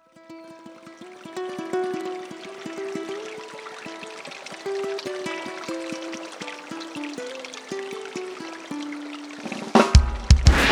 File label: Thunder from Heaven